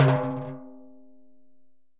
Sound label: Timb L Pressroll, Latin Percussion; Drums; Drum Hits; Timbales; Ableton; Factory Packs